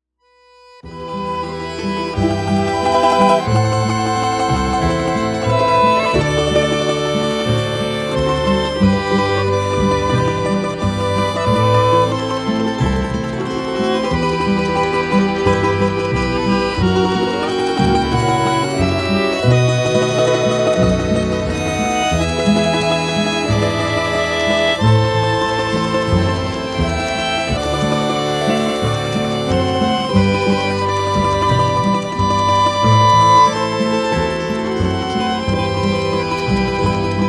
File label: Trio CZARDAS